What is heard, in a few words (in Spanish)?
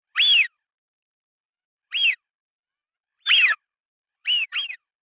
silbon